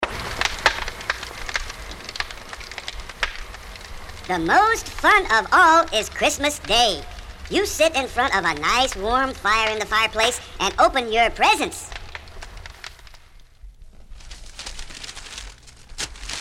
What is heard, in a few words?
Jingle Bells; Special Ed Xmas